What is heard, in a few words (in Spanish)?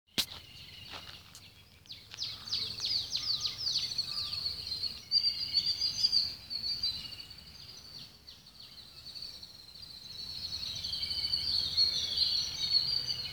Sonidos bosque; AUROVILLE